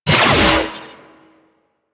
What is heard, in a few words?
Trip Laser Impact; Warframe; Trapper